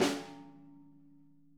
snare, Interactive Drum Kits; Sonic Reality

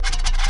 SCRATCH, rhythm loops; Rarefaction - Poke in The Ear With A Sharp Stick

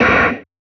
Snares, Alesis Performance Pad

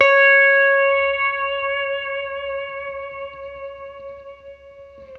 Guitar & Bass; Quantum Leap

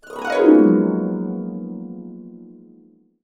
Gb MAJ, Harps; USB Soundscan vol.62; Complete Harps; AKAI

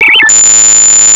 RHYTHM; CMI Sound Library Rev 1.3

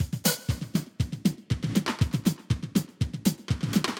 Drama Drums (120BPM); musicradar

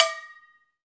Fijidera; Club-50 - Foundations Roland; Agogos

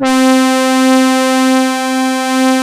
C4, Analog; USB Soundscan vol.49; Analog Fantasies; AKAI; PROPH

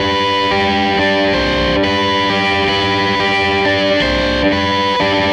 Les Guitar Loop

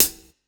Hat, breakcore Drum Hits

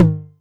Tom Hi, Novation Drumstation 909

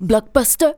vox, Techno Trance; Ueberschall

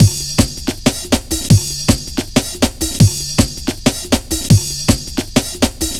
160, Drum Loops; Total Drum&Bass

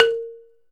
Balafon A#3; EMU Planet-Earth

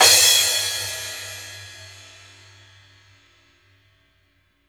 CRASH, Drumworks; Sampleheads; New York City Drumworks VOL-1

